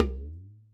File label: Talking Drum Bend; Sonic Foundry (Sony Creative Software); Joe Vitale Latin Percussion